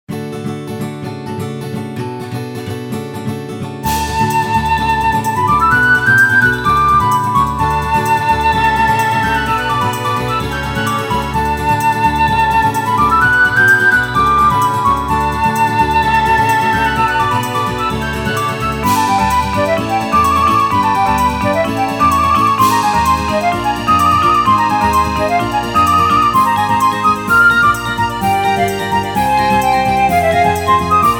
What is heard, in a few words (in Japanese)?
kawaii youseitachi to guitar hiki; さわやか ギター 妖精楽隊; 迷い込んだ旅人のギターと妖精楽隊のさわやかセッション。